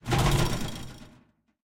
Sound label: shoot rattle end; War Thunder